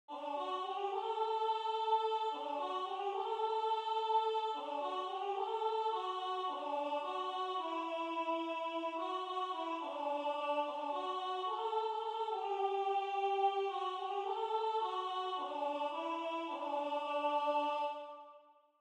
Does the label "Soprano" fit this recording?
Yes